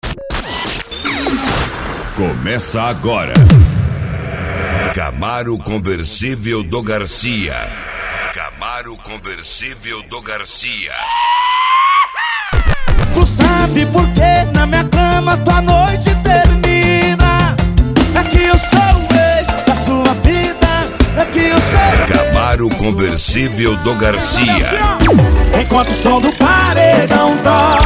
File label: Sertanejo Piseiro Modao